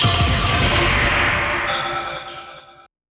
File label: FX; LiLA'c Records PsyTrance Sounds 01